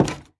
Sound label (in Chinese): 樱花木 门 开关声